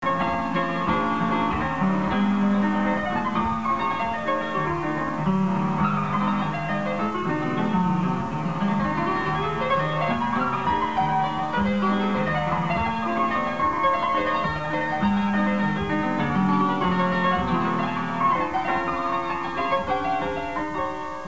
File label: maple leaf rag, music box